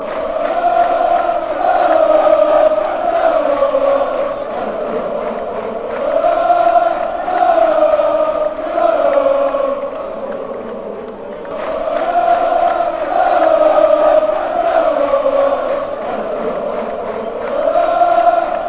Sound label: chant, fanchants; club brugge